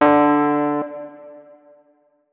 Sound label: notes, keyboard, electric piano; Hideout Furniture